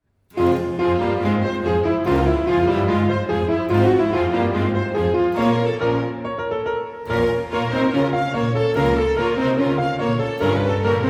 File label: Solo Concertos, Keyboard; Bach; Sondeckis